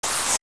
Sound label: Skulltula Hit; zelda; OOT